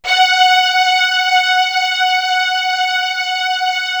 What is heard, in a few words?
str f#4, Strings, KORG DSS-1; ProSamples vol.55 - Retro Sampler